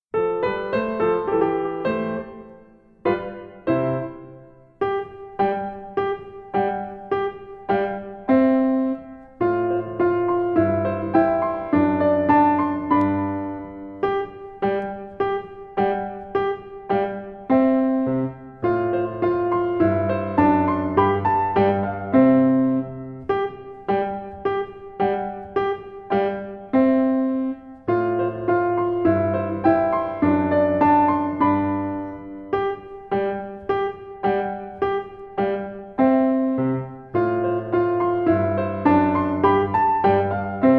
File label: Beat; Whap Bap Banh bao